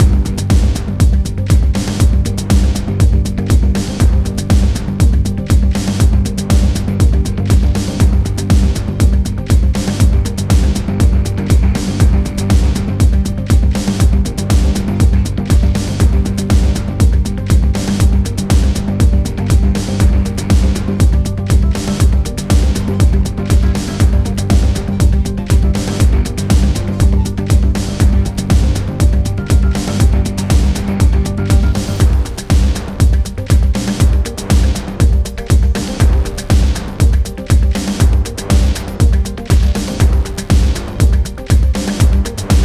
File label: Remix Loop; Fortnite; Hero Outlander